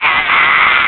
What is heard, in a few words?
Shock; getting shocked; Link getting shocked